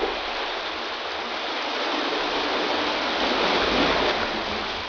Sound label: sea cave